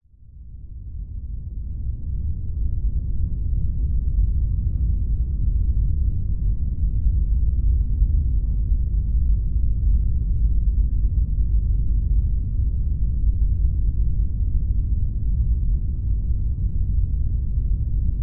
science fiction spacecraft onboard ambience med speed rumble airy; zapsplat